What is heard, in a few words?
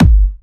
Kick, 138BPM; Myst Kick